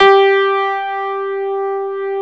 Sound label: piano G4, 2 Step; USB Soundscan vol.51; House Side Of 2 Step; AKAI; PIANOS